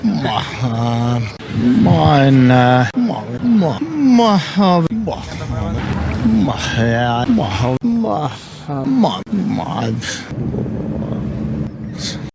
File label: bwoaaaaa